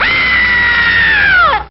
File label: duck scream